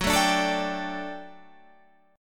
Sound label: G Major 7th Suspended 2nd Gb